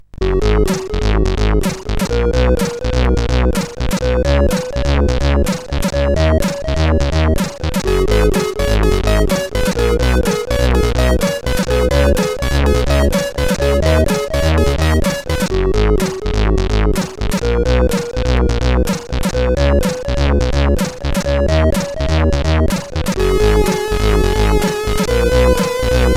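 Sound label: Voice, MOS8580R5 sid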